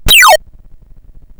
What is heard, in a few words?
Manic Miner 64DX, CSG8580R5